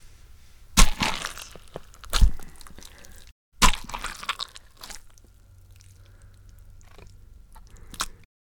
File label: Cantaloupe Insides Grab And Plop